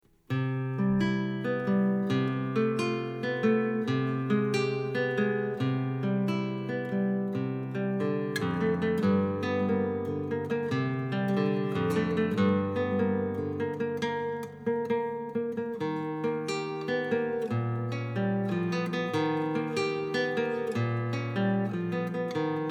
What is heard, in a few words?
classical guitar; Brouwer